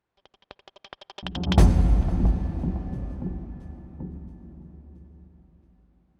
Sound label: 89 BPM; DalekStealthStart